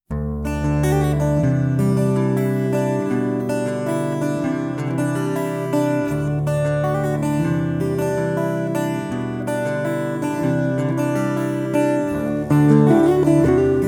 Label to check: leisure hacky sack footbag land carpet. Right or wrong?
Wrong